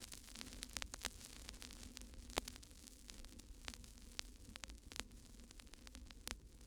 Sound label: Vinyl Crackles